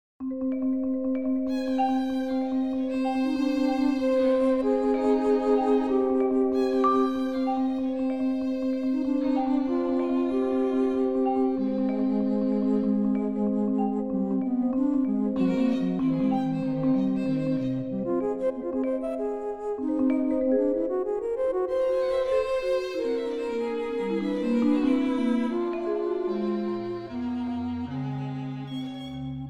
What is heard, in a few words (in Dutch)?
Viool Basfluit en Marimba Stuk; Suite for Bass Flute, Marimba and solo strings; Introduksie